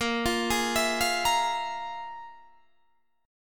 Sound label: F Minor Major 7th Double Flat 5th